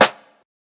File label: bright snare drum sound c key